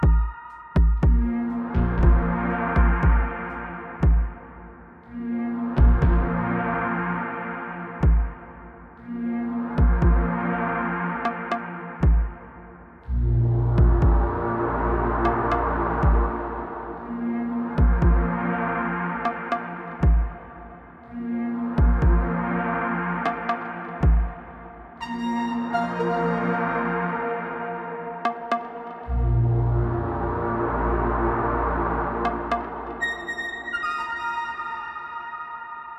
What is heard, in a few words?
Loop 120bpm; Mysteriblip; jenny leclue detectiv